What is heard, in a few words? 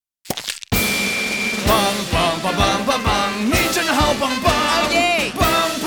music